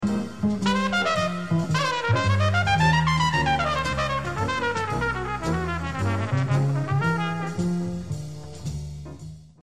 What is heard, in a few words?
Grand Valse solo